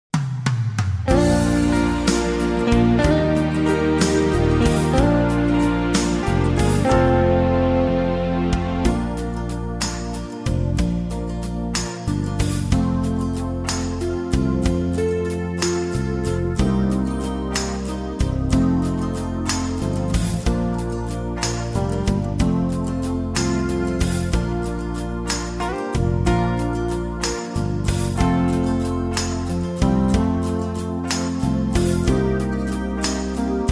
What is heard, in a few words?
Bb No Backing Vocals, backing tracks